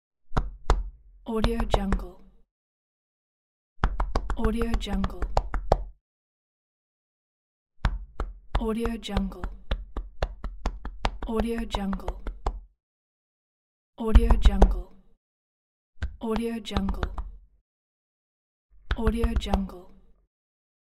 beat chest

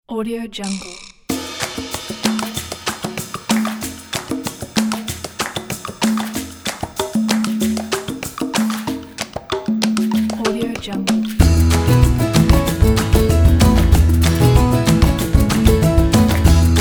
tropicana

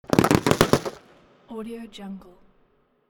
firework single; audioheart

